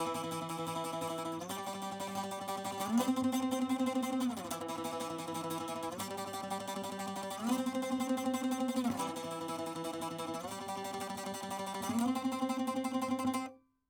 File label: saz